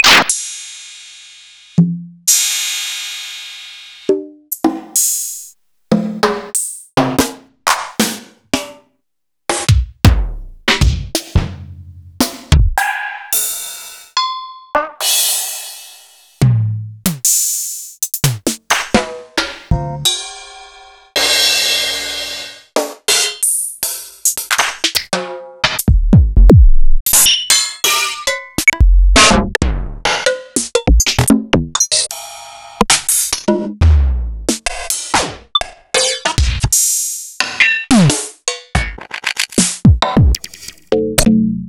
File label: EMU Orbit